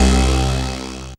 dance chord

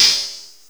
METALLIC HIT; KillerTweaksII; DirectWave

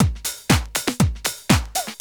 HOUSE 120; 115-121 BPM

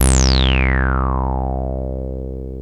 TB BASS; TB-303

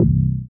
BASS STER.

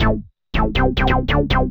SNTH BASS ELCTR 140 A, Techno

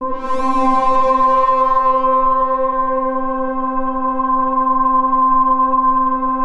Pad C5; Nucleus Soundlab; OB Resurrection; Physix Pad 1

